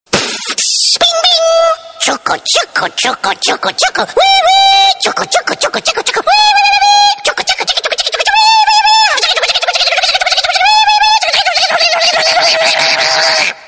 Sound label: a real crazy tone